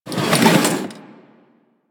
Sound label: Classroom Chair Drag; Ambience; Warframe; The New War; Quests; Zariman